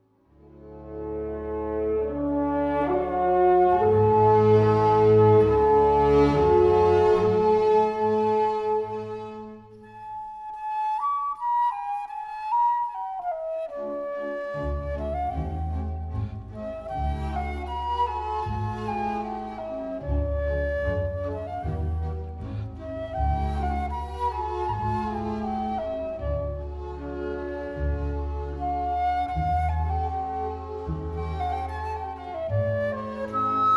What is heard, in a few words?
flute concerto in G major adagio ma non troppo